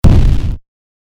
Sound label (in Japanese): 爆発 小; モノラル; 武器効果音